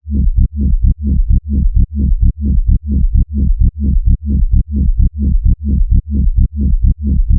Bass Loop 130 C#; Techno; Ghosthack; Free Techno Sounds 2024

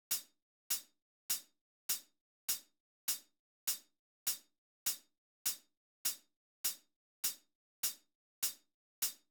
Drum Loop - 101 BPM Hihat; Loop Stems; Cymatics; DOPE DRUMS VOL. 1; Red Room

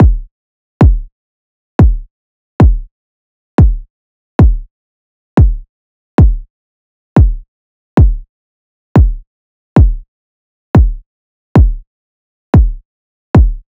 Drum Loop - 134 BPM Kick, garage; Loop Stems; Drain

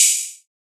Open Hihat; Salt; Cymatics; Mystery Pack Vol 4; Drum One Shots

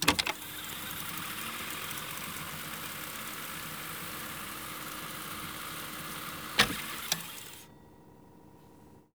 Gear Foley Reel to Reel Fast Forward; FX; Cymatics; Eternity Sample Pack

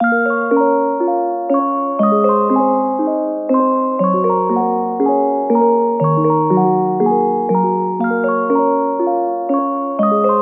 Nostalgic Melody Loop - 120 BPM A# Min; Cymatics; Oracle Sample Pack

